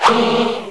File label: BUGS; Alien